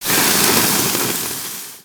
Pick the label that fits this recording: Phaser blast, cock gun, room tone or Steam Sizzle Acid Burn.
Steam Sizzle Acid Burn